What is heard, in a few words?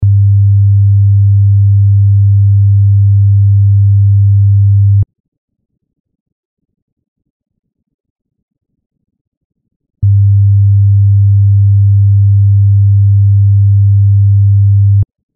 Phase Test